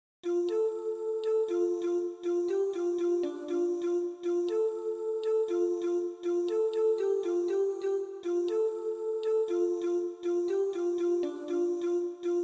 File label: soprano